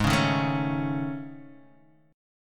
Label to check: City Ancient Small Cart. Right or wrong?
Wrong